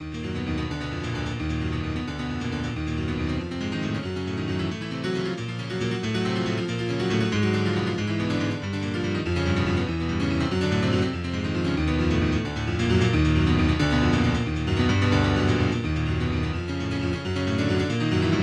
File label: Prelude in E Flat Minor